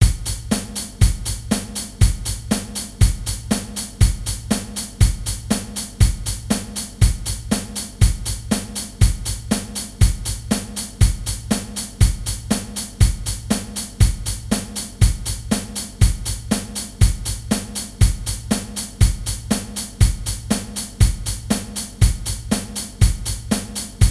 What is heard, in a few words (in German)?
Standard Beat Schlagzeug; Hörbeispiel